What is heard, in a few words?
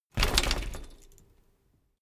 bicycle bike land rattle full